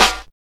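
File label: SD, snare drum; X-Static Goldmine 1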